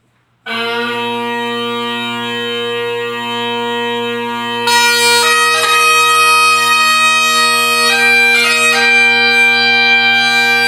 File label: Amazing Grace bagpipes